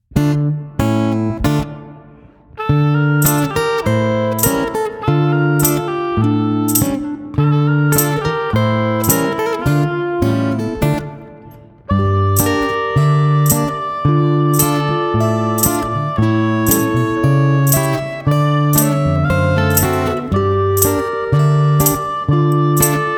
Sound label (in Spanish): taco y punta - instrumental